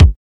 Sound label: 00s wet bass drum single shot d sharp key